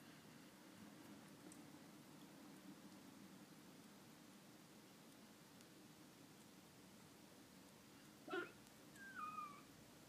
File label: Squeaks